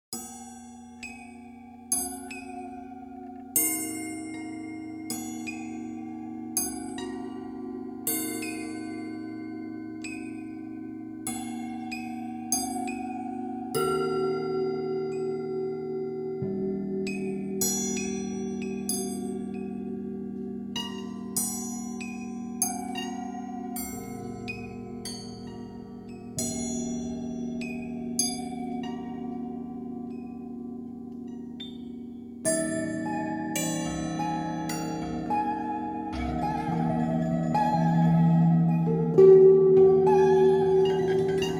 ghing

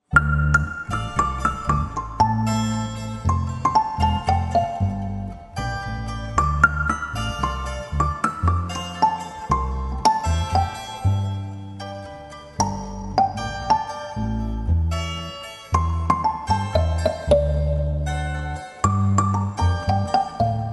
tines echoes

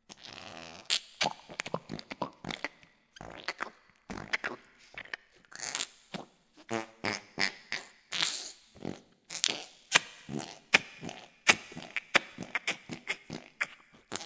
Funny Noise Gooze

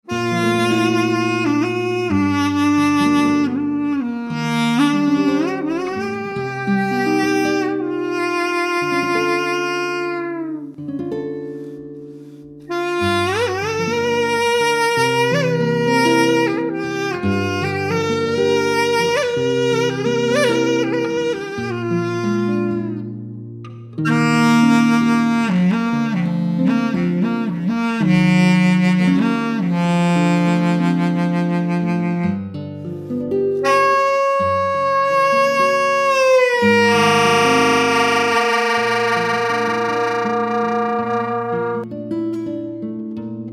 sax

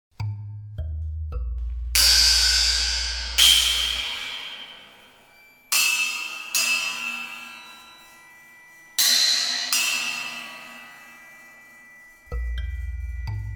Creased Bands

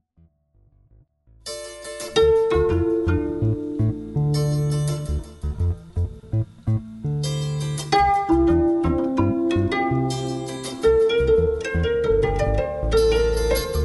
twist harp

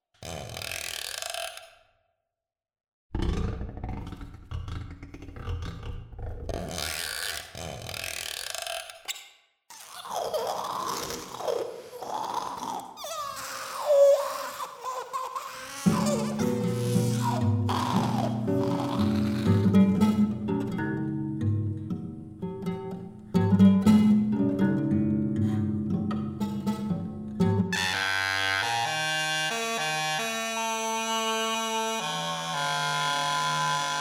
Funny Noises

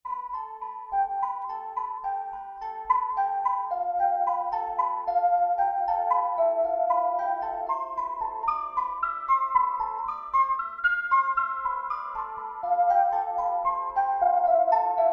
string tine

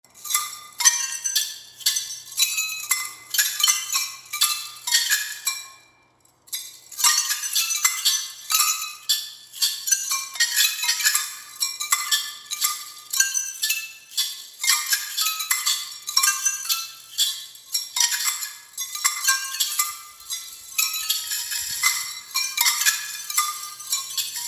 Dabba Shakers

